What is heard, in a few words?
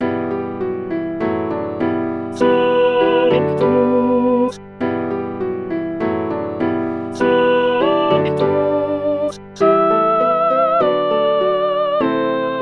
sop sanctus